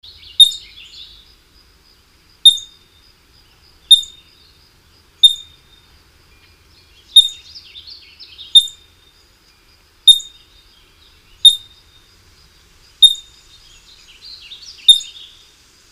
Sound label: California Ground Squirrel